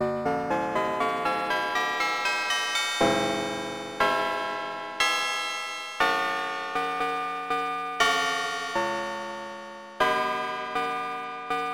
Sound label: Chime Chorus, synth